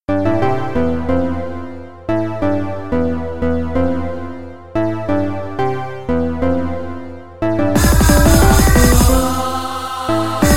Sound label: stabs